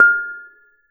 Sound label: Bonang P, Gamelan